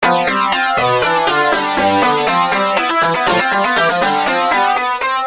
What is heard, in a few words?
depressing rock music